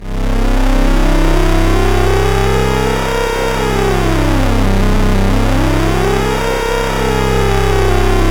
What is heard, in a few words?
SYN LEGEND, SYN MicroWave; Sound & Vision - Gigapack I CD 2